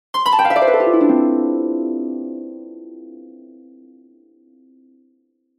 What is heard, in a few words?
harp